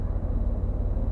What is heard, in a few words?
idle, vehicles; syclone; TDMCarpack; TDMCars